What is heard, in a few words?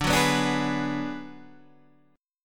D 6th Flat 5th D down Guitar Standard, chord; D6b5 strummed; JGuitar